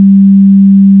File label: g3 open, Pitch Pipes